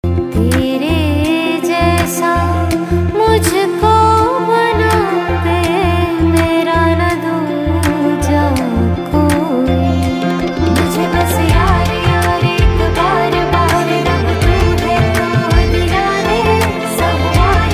Female Ringtone; Bollywood; Satyameva Jayate

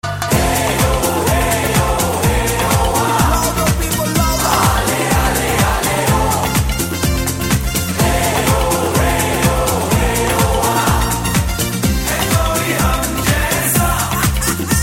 Cricket Song; IPL T20

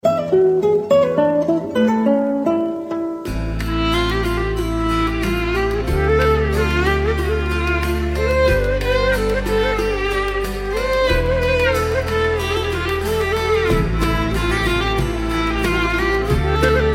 Nokia Sad; Ringtones

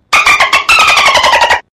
dolphin sound; Pets And Animals Ringtones

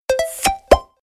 hubble bubble, Message Tones

